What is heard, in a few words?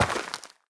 step light, footsteps, player, dirt